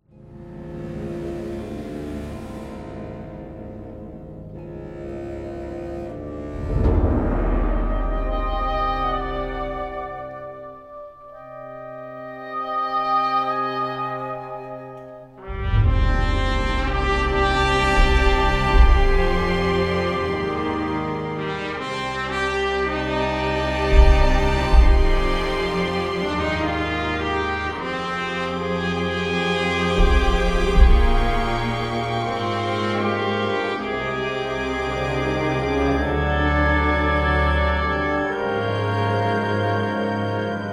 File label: Mystery Music One Shot; battlefield bad company 2